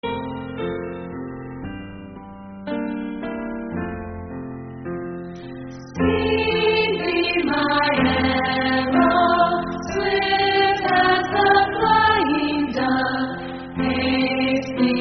Speed Thee Ringtone; Pi Beta Phi

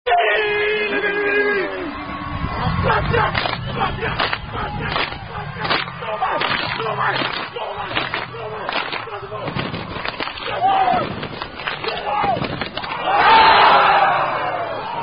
Birmingham Football Chant; Wearing All White

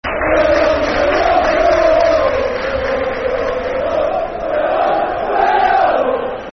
We Are Wolves Football Chants (Soccer Song); wolverhampton wanderers; fanchants

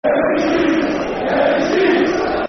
Empty Seats, Football Chant (Soccer Song); Newcastle United Nufc

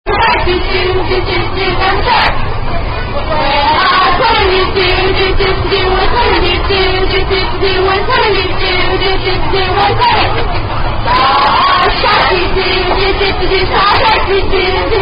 Shark Song On Bus

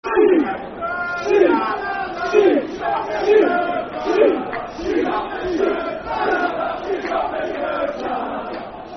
Sheep! Sheep! Sheep! Football Chant (Soccer Song); Brighton Bhafc

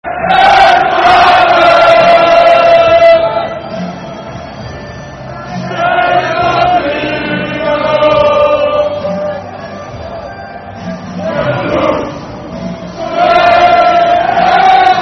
Football Chant, Soccer Song, Netherlands; Holland Hfa Netherlands

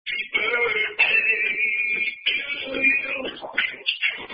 Happy Birthday, Rap Version; Chili's

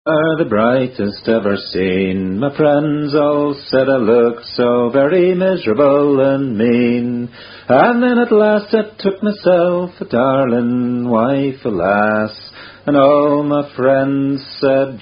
Roaring Baby

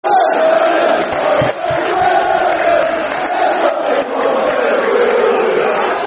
One Ben Williams Football Chant; Crewe Alexandra Cafc

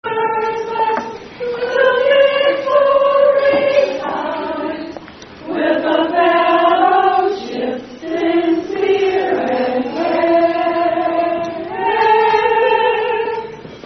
Alpha Kappa Alpha Hymn; Aka Salute; Wedding

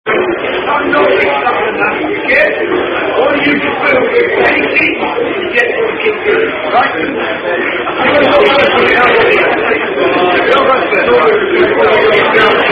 Rugby Drinking Games

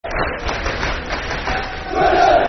Clap, Football Chant; Swindon (Clap); Swindon Town Stfc

